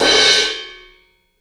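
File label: c suspended cymbal crash with medium choke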